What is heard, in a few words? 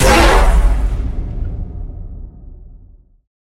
Scary Hit; soundscrate